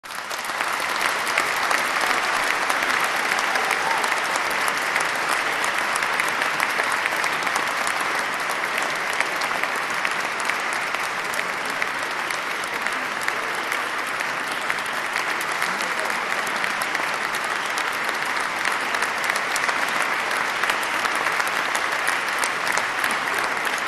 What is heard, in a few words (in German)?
Applaus; Blaeser des Orchesters Hark The Heralds Angels Sing Felix Mendelssohn Bartholdy